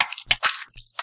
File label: nether extract blood; nether-pack